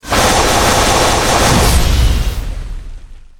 打斗 连续打斗

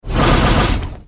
瞬移 连闪

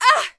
女-受伤

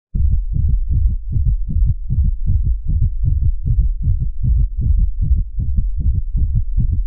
紧张急切心跳声

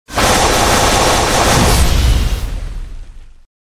打斗 大混战

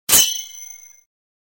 惊吓

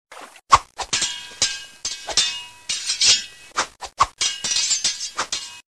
冷兵器打斗

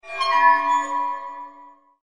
惊讶-奇幻镜子

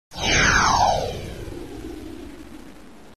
施法飞跃音效

